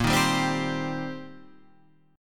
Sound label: C Minor 7th Bb